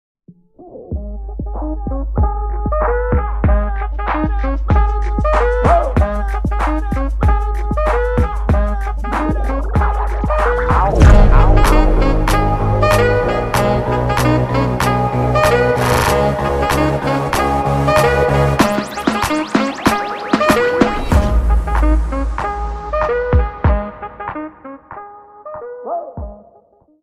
Remix Instrumentals

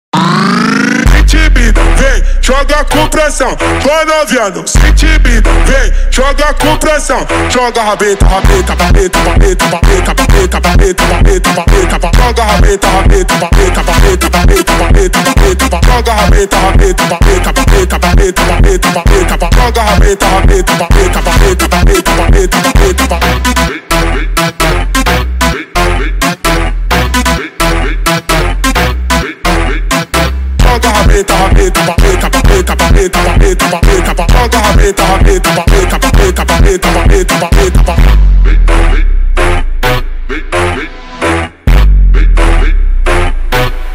Phonk MONTAGEM

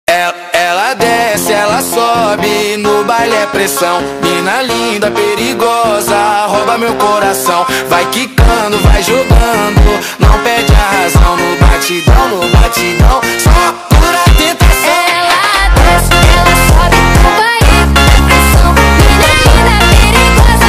Phonk

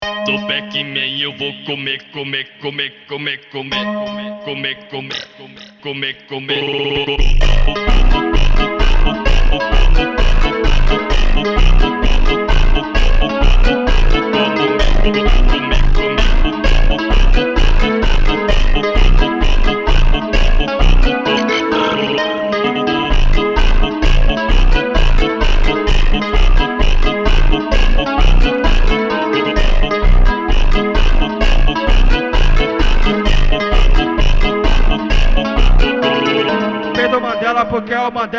Phonk

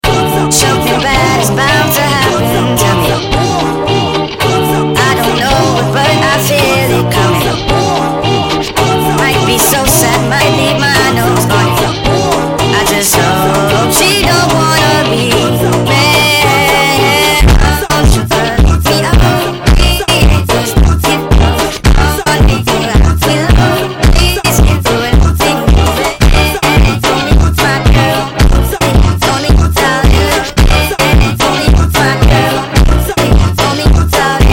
Phonk

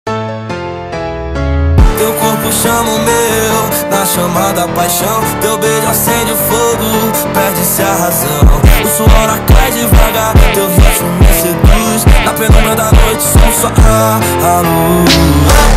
Phonk Montagem

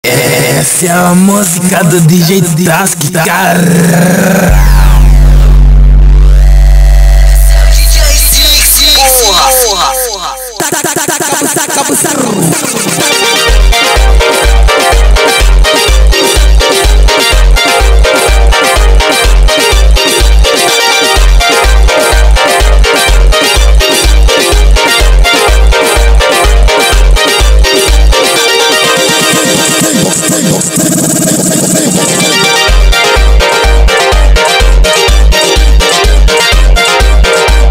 Phonk

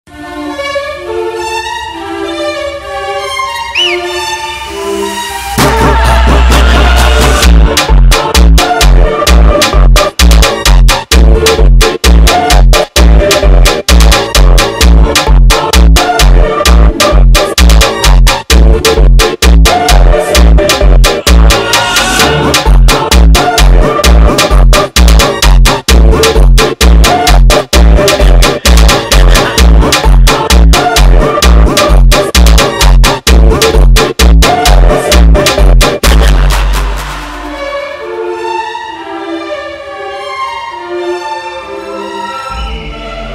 Phonk

